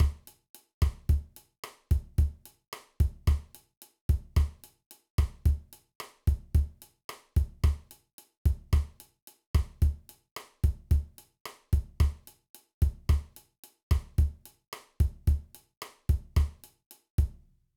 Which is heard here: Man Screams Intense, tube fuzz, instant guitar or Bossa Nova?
Bossa Nova